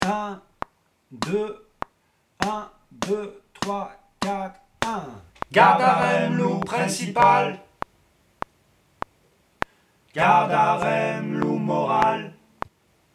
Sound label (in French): Les trois voix, chorale